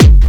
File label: Bdrum